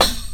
Perc, Percussionz

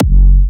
Kicks, Big Room Kicks, F#4

